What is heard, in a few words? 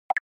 Percussive Accent High; 打楽器アクセント